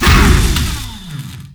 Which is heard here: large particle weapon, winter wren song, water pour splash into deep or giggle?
large particle weapon